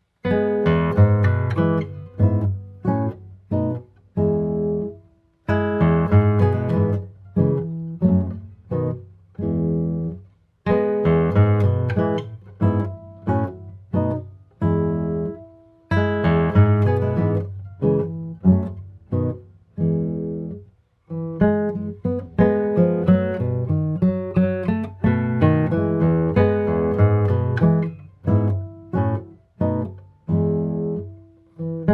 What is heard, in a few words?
Pyrenese Melody, guitar